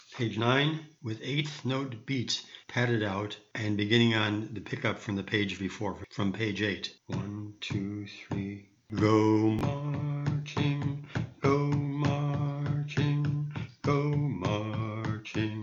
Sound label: bass, chorus